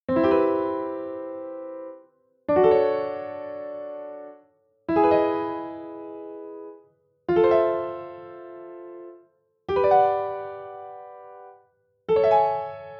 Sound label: chord name major7 sound